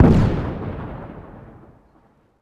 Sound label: frag grenade explode far